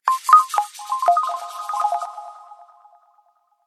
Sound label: Rain drops, ringtones; Motorola RAZR-V-XT885